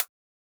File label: Closed Hat; Drums; HiHats; Ghosthack Advent Calendar 2017 Day 5